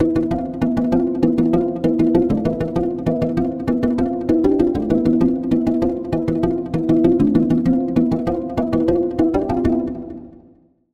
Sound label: Pluck; Routed Sound; Serum 2 Expansion - Noble Gangster Vol.1